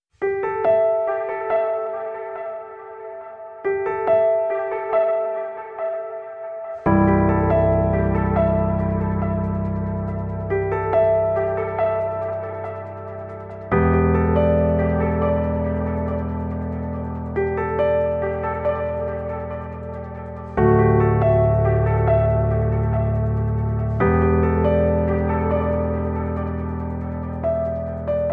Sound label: Piano Only